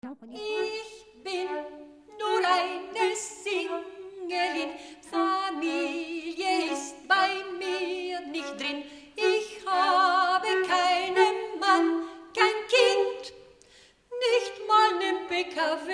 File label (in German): Tango